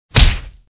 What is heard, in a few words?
punch